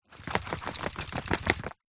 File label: animals dog scratch body with leg collar shake; zapsplat